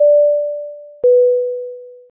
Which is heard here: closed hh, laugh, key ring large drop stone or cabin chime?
cabin chime